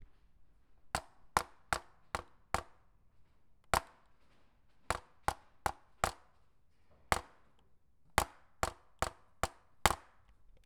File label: Slamming Keyboard; West Hall Tech Dump